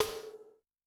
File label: Wacky EFX, Snare; Yamaha DTXpress-II